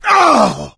hurt major, survivor voice; Left 4 Dead 2; coach

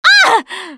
hurt major, russian, Survivor Voice Producer

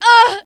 woman hit; Mount&Blade Warband

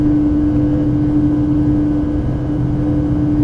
lab loop, machines; Garry's Mod; hl2

